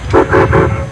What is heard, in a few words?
3 toots